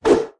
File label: popup close; The Croods